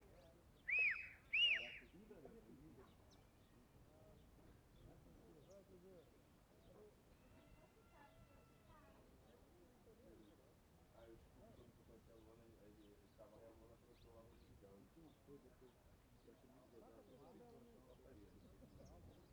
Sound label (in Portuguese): Ambiencia cidade tranquila com poucas vozes masculinas e assovio no inicio